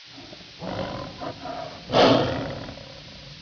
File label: growl; colosseum